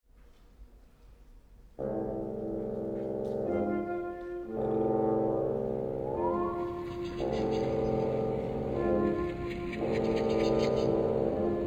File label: Bassedanse